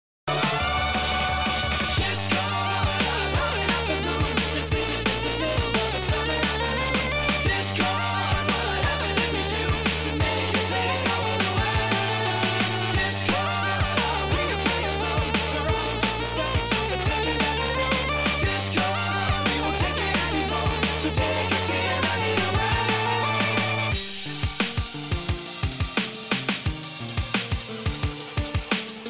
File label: Sonic Breakbeat Remix; Everfree Radio